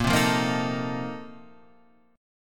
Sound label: A sharp Major 7th Suspended 2nd Suspended 4th A sharp Guitar Half Step Down